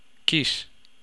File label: kiss